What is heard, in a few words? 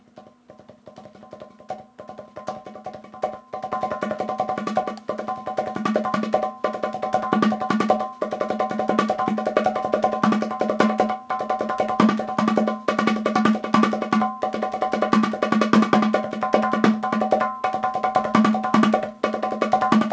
Basic Hand Drum, gourd drum